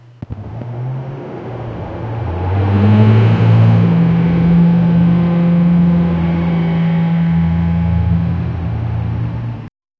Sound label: loud short truck horn two